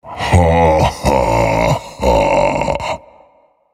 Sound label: Vocal Laughs; Minecraft Story Mode; Colossus